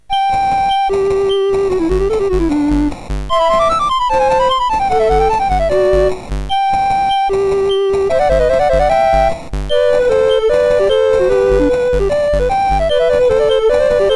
Mozart Duet, MOS6581; Syl

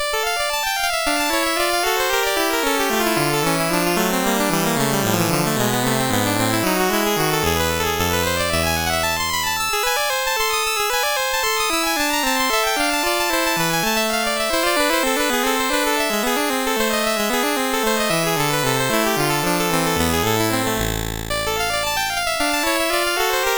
Persto from Sonata in D Major, MOS6581R2

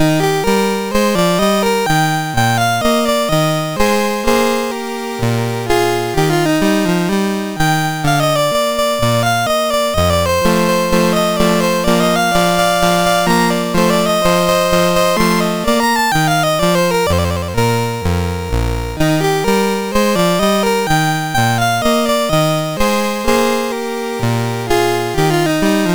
march, MOS6581R3

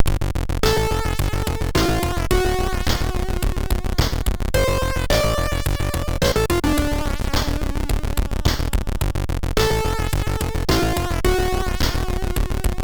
Lights On, MOS6581R3

